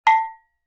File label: Cowbell High; Vintage Cartoon; Drums & Percussion